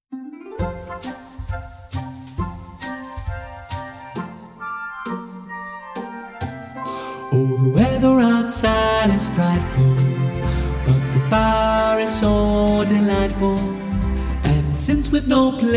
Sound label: BASS